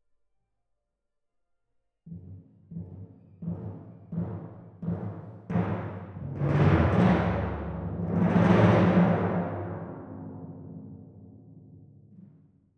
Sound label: Timp